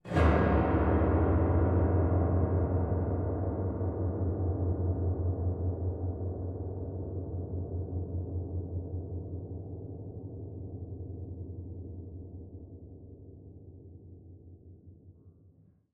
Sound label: cave, ambient; minecraft; SMCNV-pack